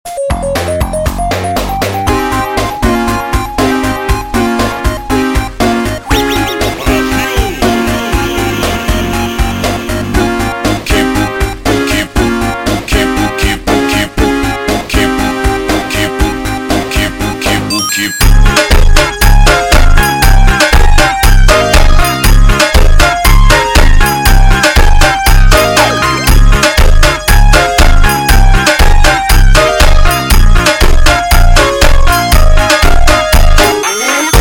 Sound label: Funk super Slowed